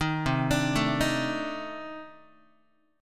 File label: C Minor 6th Add 9th C Guitar; Cm6add9 arpeggiated; JGuitar